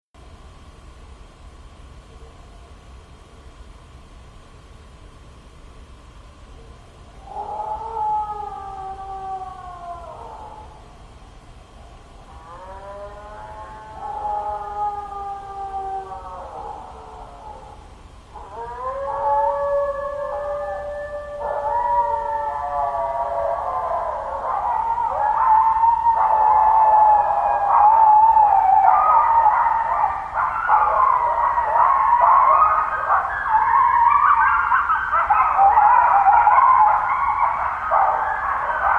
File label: possibe bigfoot imitating wolf, cow; sasquatch